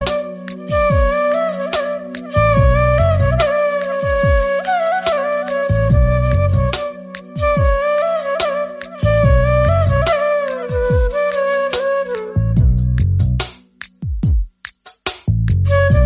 flute bgm

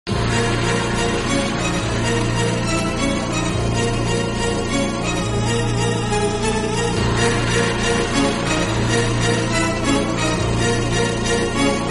marimba remix instrumental song

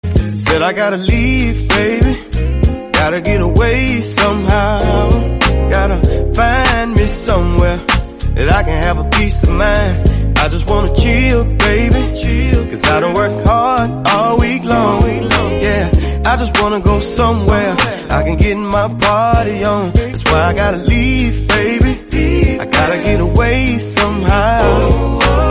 english song

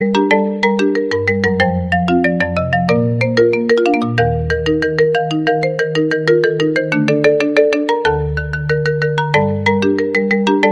marimba mix bgm; jujutsu kaisen

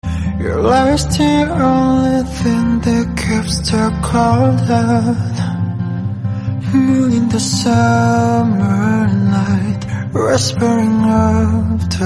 korean song

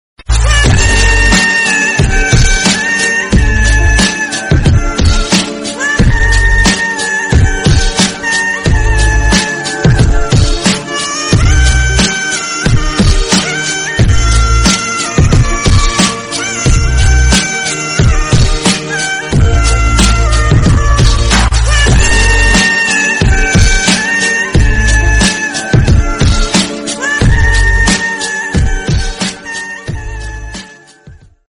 instrumental bgm

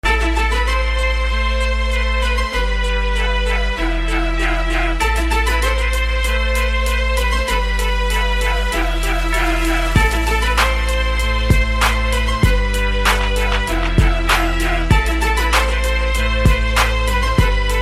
beat

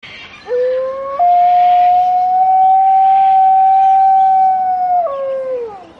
howling wolf sounds

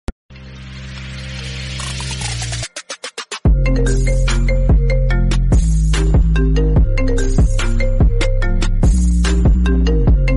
iphone dj remix apple iphone

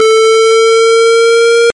sirent sound; rizz

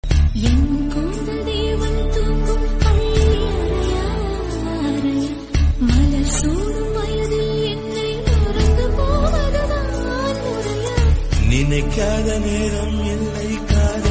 tamil; thenkasipattanam

